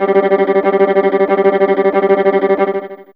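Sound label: Violin Tremolo G4, Fairlight CMI IIe; TremStr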